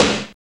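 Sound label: SD, snare drum; X-Static Goldmine 1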